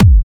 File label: Kicks